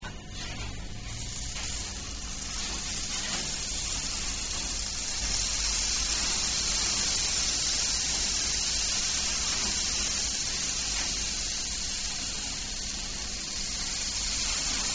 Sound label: rainstick